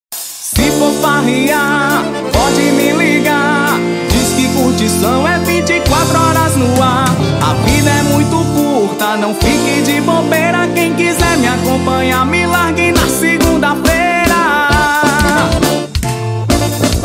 vaneirão

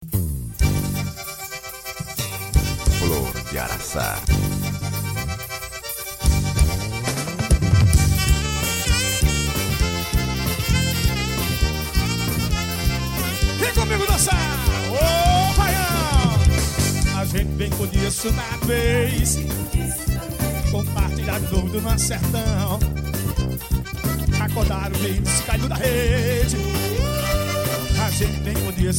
forro baiao

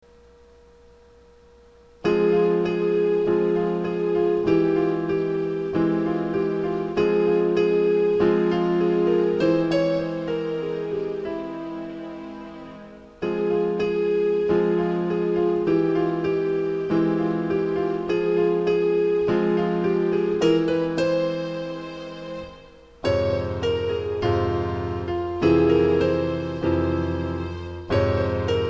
instrumental

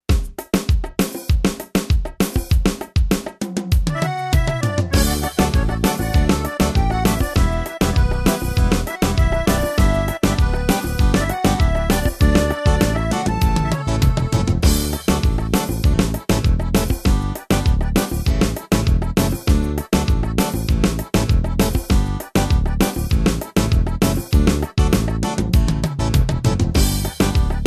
ritmos yamaha, sertanejo dancante universitario

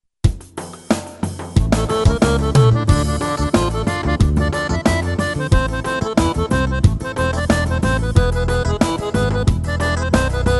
xotao das antigas

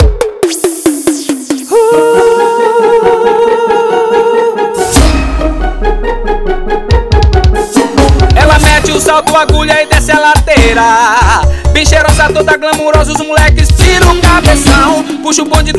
toca o solinho

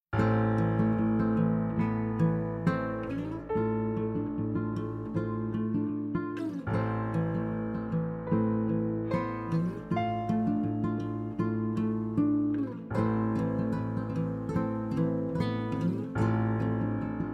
trilhas para filmes mistario triste

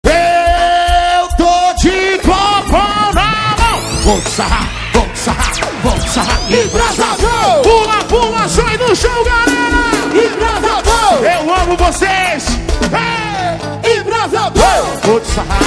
ao vivo no festival; festival da virada

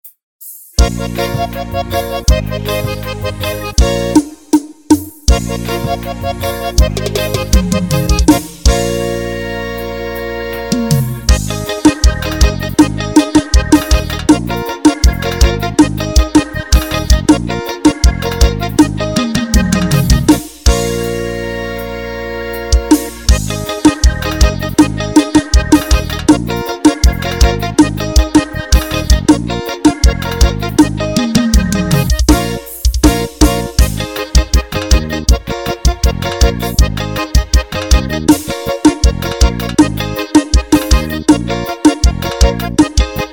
forró

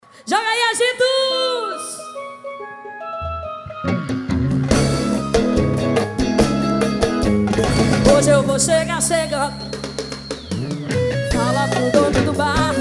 carnaval

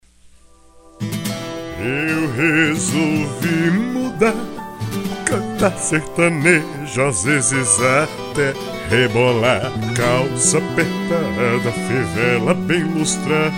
sertanejo lapada

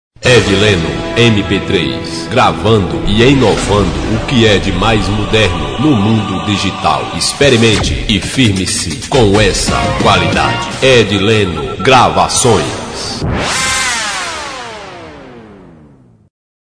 cantor romântico vinheta

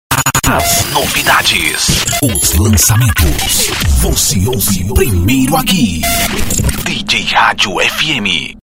vinheta dj radio; studio power mix

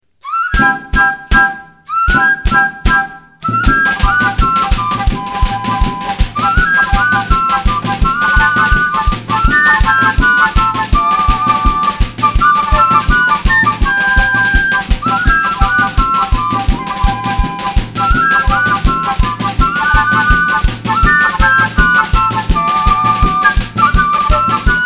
marcha de picadeiro

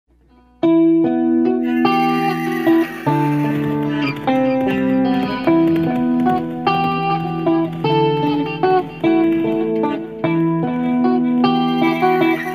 cavalheiro medieval

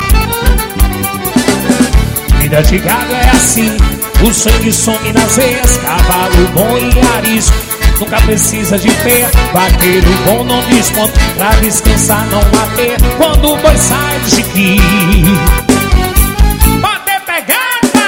forro universitario

